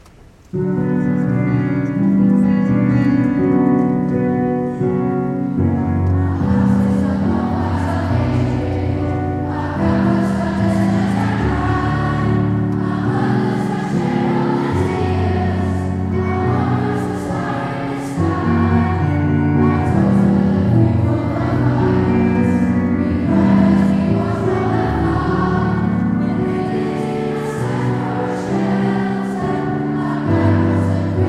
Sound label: Carol of the Refugee Children Yr 7 Choir